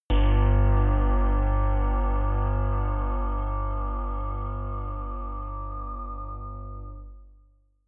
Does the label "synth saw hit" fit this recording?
Yes